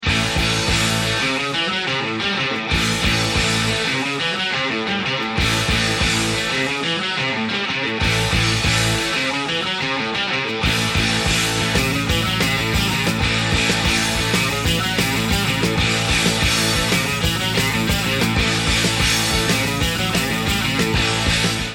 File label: ringtones